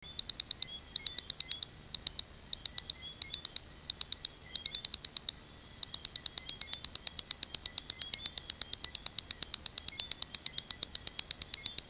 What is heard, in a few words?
Eleuthe auriculatus; amphibia